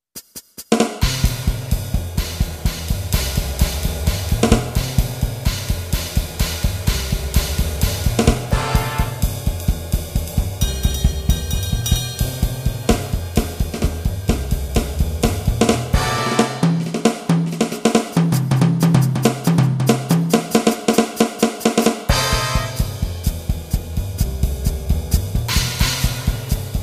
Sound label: jazz